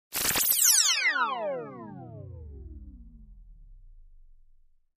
Descend Electronic Descend with Shimmer Ripple

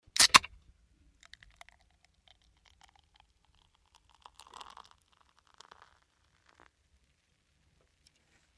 Beer Can Pull Tab Opn F

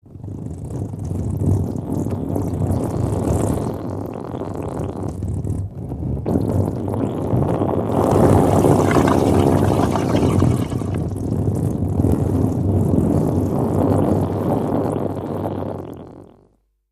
Blood Breath; gore stabs & eat

